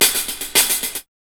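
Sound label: HI HAT PATTERN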